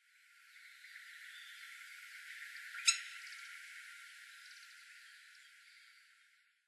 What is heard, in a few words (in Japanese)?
yamasemi, hyogo